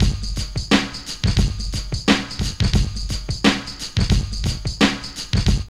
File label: RING SNR 88, Funky Elements LOOPS; Zero-G